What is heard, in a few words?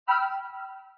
toy Found; emu-mess